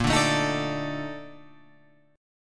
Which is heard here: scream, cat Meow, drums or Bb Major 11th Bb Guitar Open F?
Bb Major 11th Bb Guitar Open F